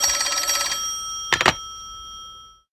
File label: phone ring